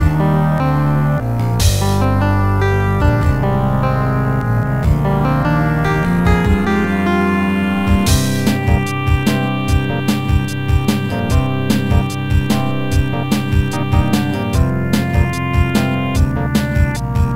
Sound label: Intro Jingle; SegaCD; videogame